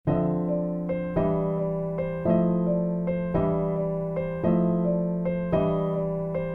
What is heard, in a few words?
bluenote in piano